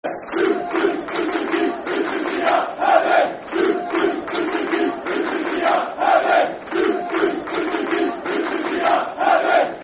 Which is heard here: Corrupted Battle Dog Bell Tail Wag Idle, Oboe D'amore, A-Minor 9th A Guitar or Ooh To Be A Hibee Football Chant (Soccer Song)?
Ooh To Be A Hibee Football Chant (Soccer Song)